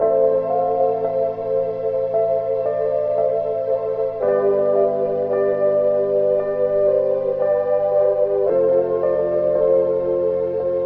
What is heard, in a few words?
keys calming; loops